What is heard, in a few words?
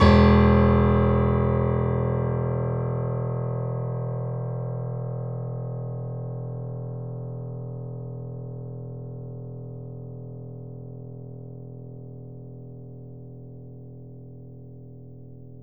Grand Piano E0 ff